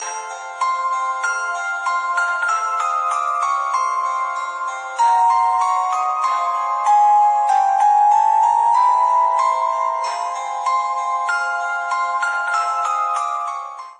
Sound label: Scary Ringtone